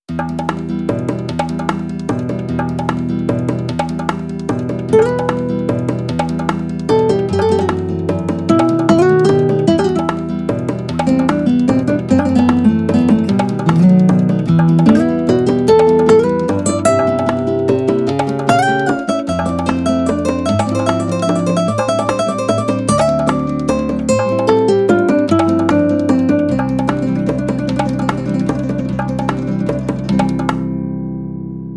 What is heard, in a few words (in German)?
acoustic track ohne hall